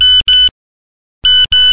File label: Vintage Nokia Sms